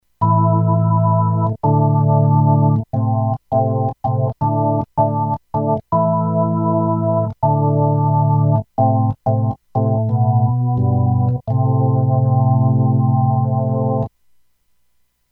Organ

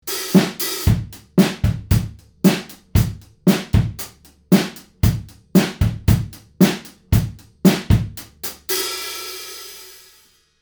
Drum Room MKH20 clean

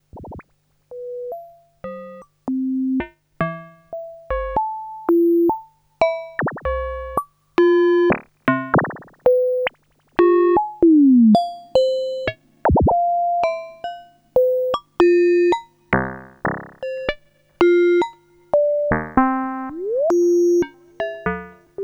FilterFM Percussion Behringer 2600

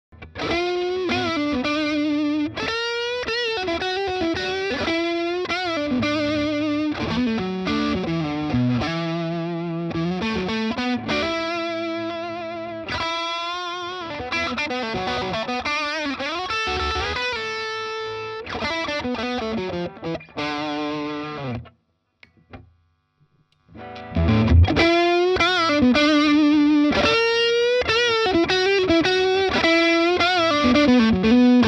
Lead 335

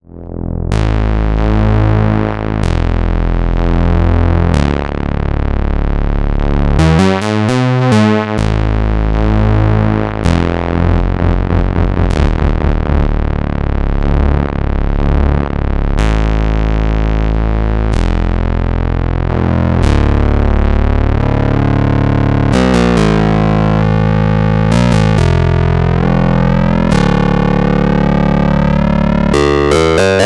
Pulsewidth Sync

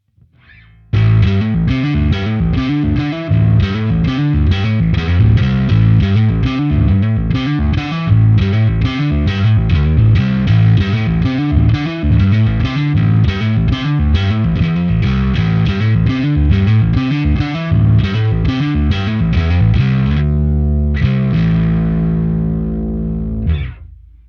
Distortion Omega

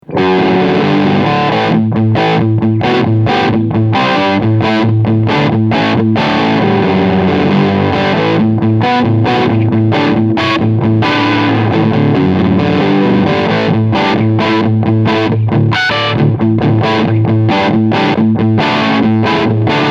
Medium Gain

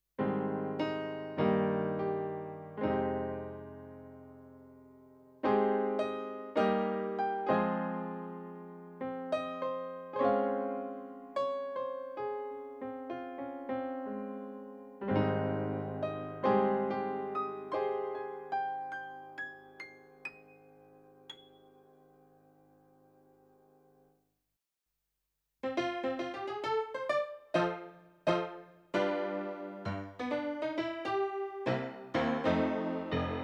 SP280 Piano

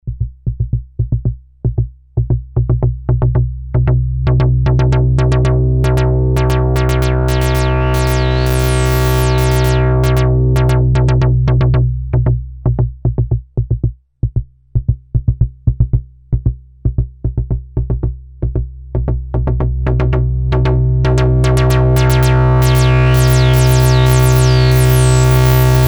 Io Instruments Euporie 24dB 12dB 6dB

